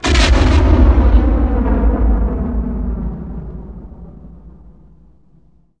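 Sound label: combat explode yt1300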